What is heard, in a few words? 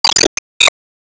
Ingame Score Minus; disney star smash android ios mobile gamerip 2020